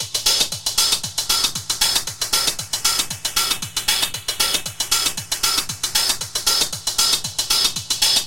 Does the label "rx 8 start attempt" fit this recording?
No